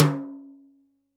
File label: TIMB, Yamaha DD-65, PERC